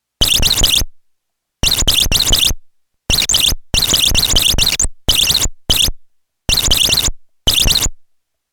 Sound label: Alarm